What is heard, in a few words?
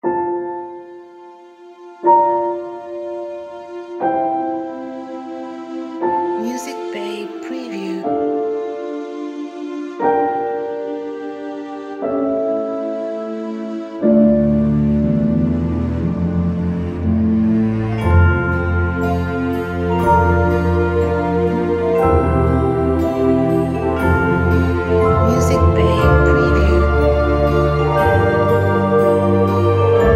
Magical Garden